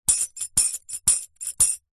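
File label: musical percussion tambourine kids rhythm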